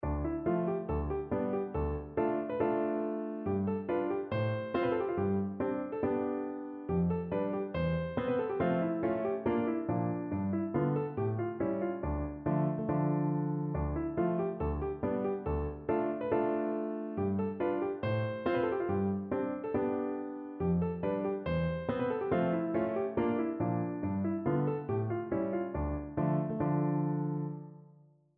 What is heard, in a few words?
romanian folkdance, piano; bartok